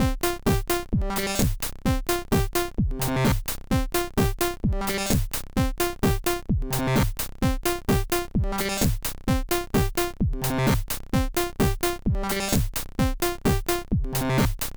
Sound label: beat; fabrik core